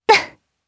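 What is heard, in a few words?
Jump, Japanese; voices; heroes; Lewsia B; kingsraid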